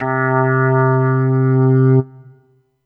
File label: Yamaha EX5 Smoky Organ C5